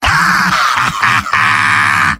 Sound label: demoman laugh evil; tf2; garrysmod; mvm